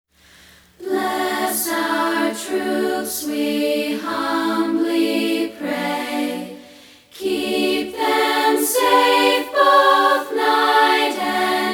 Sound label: Bless Troops A Cappella